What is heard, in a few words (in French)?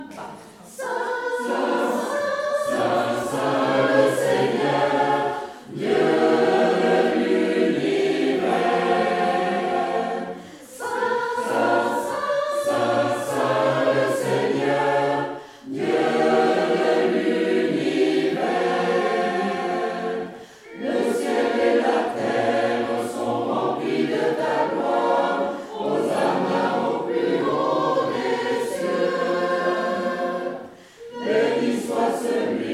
Sanctus messe de la visitation Tutti